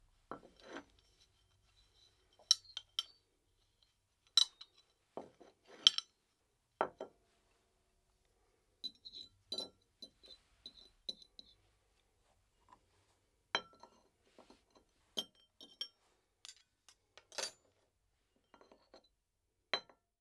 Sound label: Ceramic Dishes Clearing Table; Fairlight Sound Lib